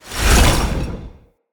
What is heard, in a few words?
Dendrit Staff Caster Cast Heavy; Weapons; Staves